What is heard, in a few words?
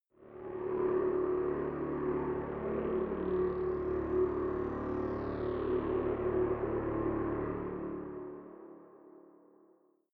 space calculating machinery